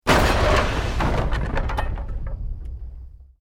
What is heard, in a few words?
EXPLOSION MEDIUM WOOD